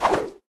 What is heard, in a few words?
Deep Devils Tower trap Throw